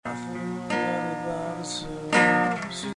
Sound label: acoustic